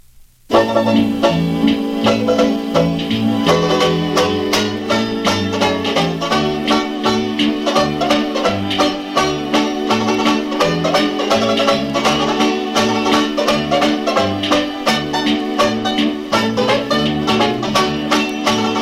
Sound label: banjo